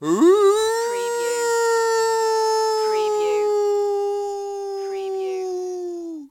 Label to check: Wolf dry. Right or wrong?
Right